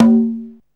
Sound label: Lo Conga, Alesis Hr16, Drums